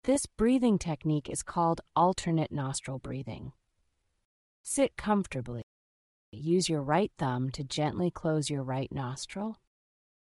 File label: Nostril Breathing; Mastering the Art of Deep Breathing; Single Nostril Breathing; Try this short alternate nostril breathing practice; Swivel